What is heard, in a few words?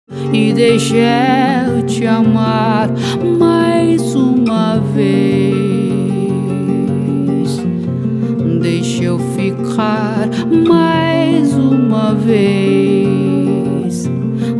music